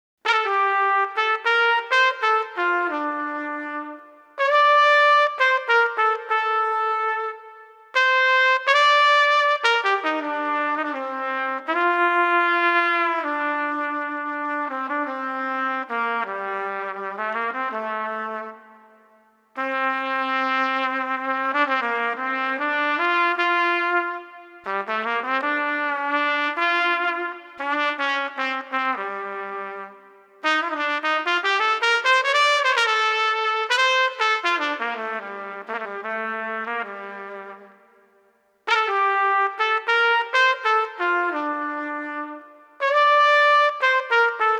Trumpet Cool Vibe 80

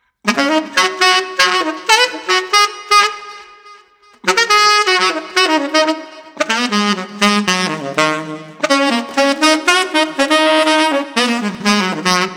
Street Sax Lighthearted

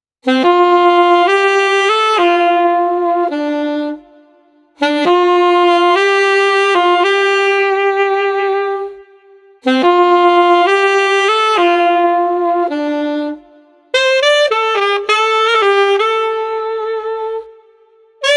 Street Alto Sax Melancholy 105

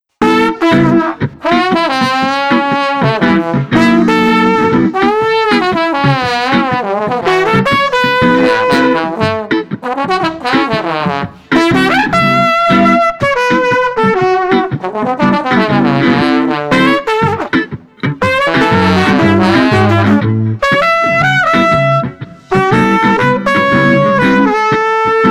Street Trombone Trumpet Combo 120

